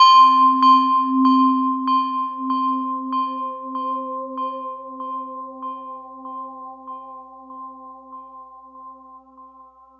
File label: Melody, IDM